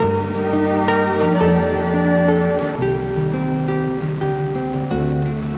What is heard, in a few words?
sting